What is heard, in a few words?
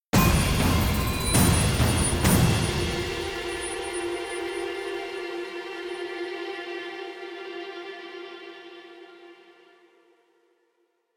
String; assassin s creed unity gamerip 2014